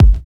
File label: Kick, Kickdrum; Equipped Music; Loungin House